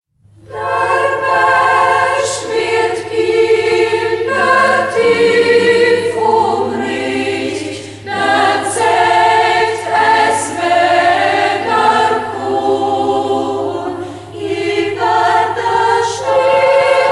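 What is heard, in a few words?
kammerchor, Schässburg; Ortsmundart: Schäßburg; Schäßburger Kammerchor; Hegt wird gesangen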